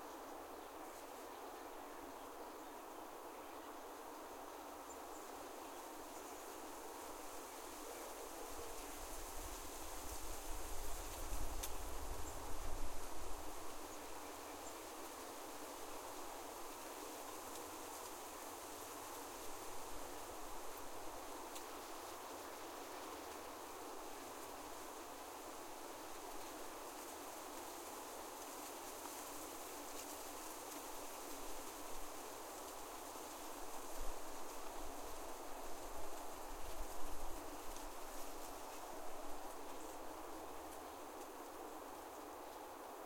wind woods winter

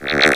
frog croak; Frogatto

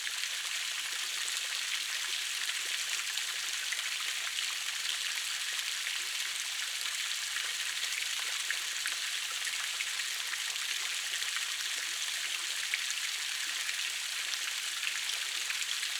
small fountain; Frogatto

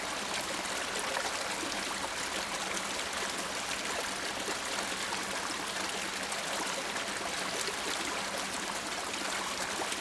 babbling brook; Frogatto

